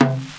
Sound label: Tom Hand Drum; Lost Tapes From Mars